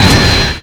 Roll; SE Ing Other Rule#16 (SE Kaiten01 Roll)

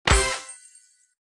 Crew Cheer; Subway Surfers City; Android, iOS; End Run Screen Crew Cheer

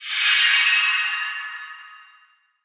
Magic; Puyo Puyo Puzzle Pop; iOS, macOS, mobile

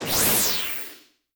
Enemy Birth Set

